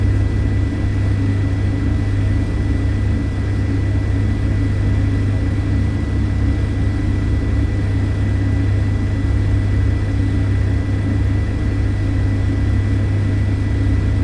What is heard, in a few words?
hvac unit drone